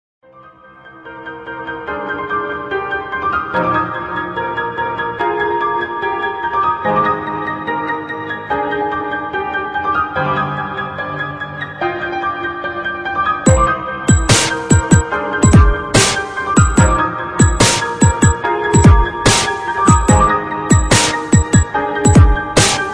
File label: sad dubstep dubstep